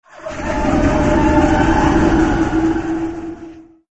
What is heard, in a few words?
Red Condor die